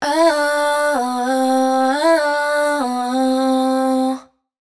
riff 128 Am wet, Vocal Sample Pack, Raw Vocals, Wet Vocals; Slate Digital Omnivox Vocal Sample Pack